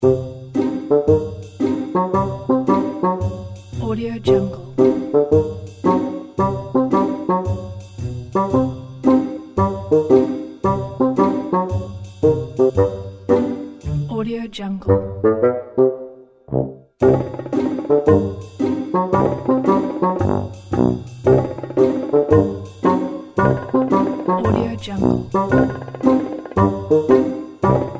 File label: funny clumsy comedy music